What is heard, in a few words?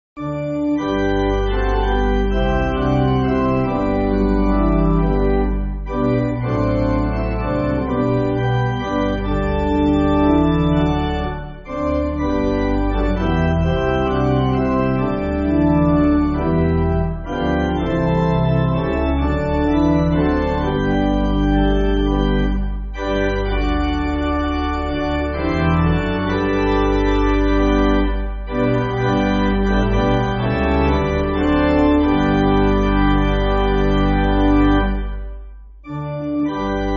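Pipe Organ, Backing Files for Churches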